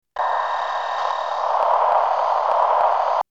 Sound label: Dead Air; Myst